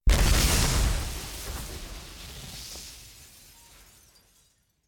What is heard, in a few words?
plane explosion